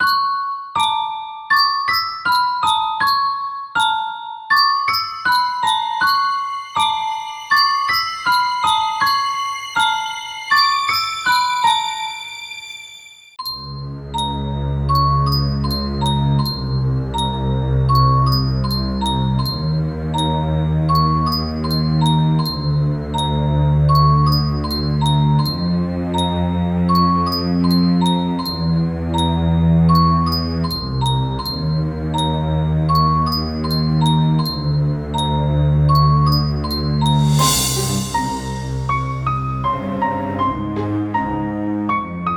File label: Music Box Remix; Pokemon Halloween Megamix 2019; Ruins of Alph; HGSS